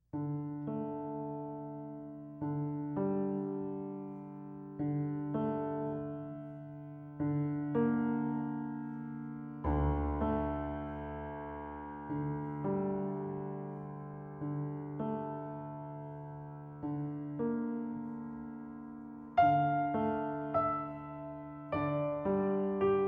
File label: Nocturne D Minor